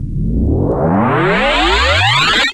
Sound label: reverse; Techno Trance Essentials; FX & ATMO